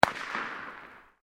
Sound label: Fireworks Skyrocket Bang Distant Isolated, Domestic Fireworks